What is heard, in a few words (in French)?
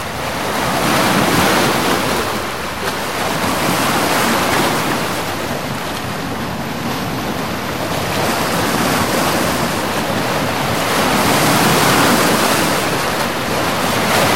Mer calme qlq vagues paresseuses ete